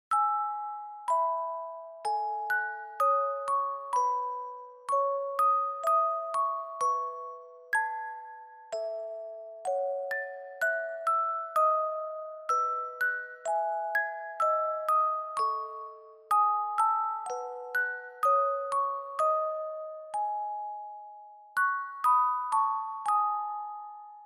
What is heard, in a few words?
Reverb Fast; Pavane For A Dead Princess